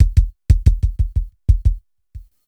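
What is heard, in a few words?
Reggae Beat Kick, left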